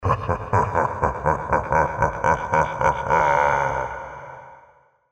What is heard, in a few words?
demonic laughter